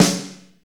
SNR

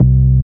DM BAS, Dance Mega Synth

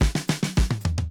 70 s Fill 108 bpm, drums; Basement drums vol 1

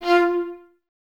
STR, String Ensembles, Violas, Detache; Miroslav Vitous

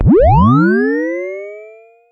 Sci fi

